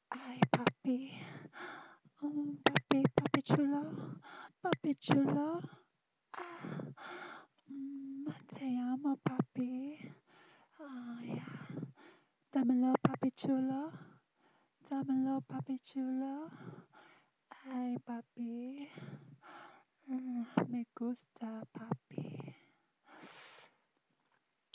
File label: Mmmmm F4M; Papi